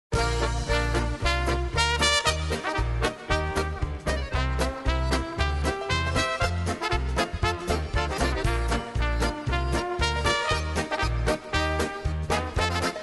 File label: Polka